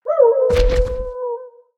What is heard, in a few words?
wolf human death